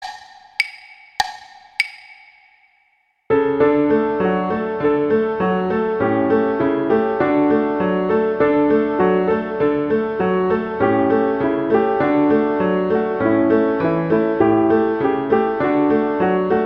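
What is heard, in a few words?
accompaniment; Christmas; O Come Little Children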